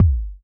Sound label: Kicks, Elektron MachineDrum SPS1 MKII